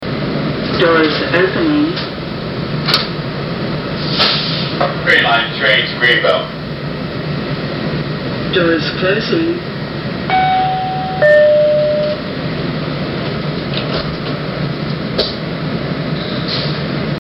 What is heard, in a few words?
alstom old chime; otp dc cars